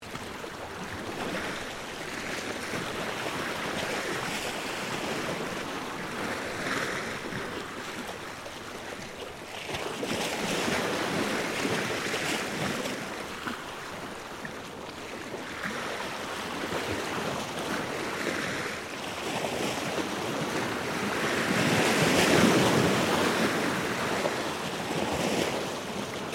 Water Waves On Rocks Medium Loop, Waves at StKilda beach; Ocean (Sound Effects:Nature)